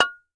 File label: Foley Ceramic Flower Pot Impact Light Hammer Tap Ten Inch; Frogsong